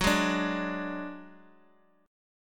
Gb Suspended 2nd Sharp 5th, Bass Standard; Gbsus2#5 strummed

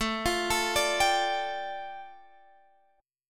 A 7th Suspended 4th Sharp 5th A

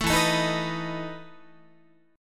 E Major 7th Suspended 4th E down Guitar

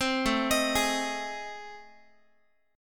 A Minor Major 7th Flat 5th, Guitar; arpeggiated; AmM7b5; 15,17,18,18,x,x; JGuitar

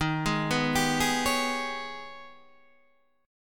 G sharp Minor Major 11th D sharp

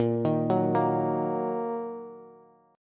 A sharp Suspended 4th Sharp 5th A sharp down Bass; arpeggiated; JGuitar

